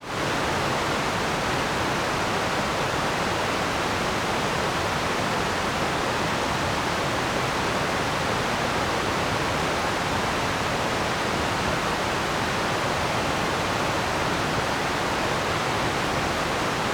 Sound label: waterfall; SIMUZENSOUND